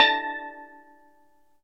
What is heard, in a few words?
steel drum